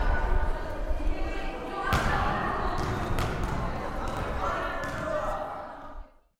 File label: class gym